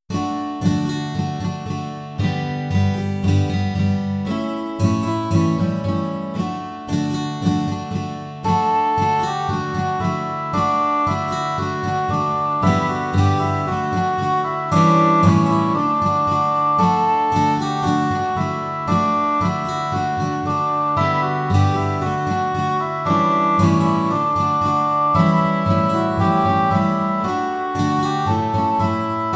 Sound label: Deck the Halls, guitar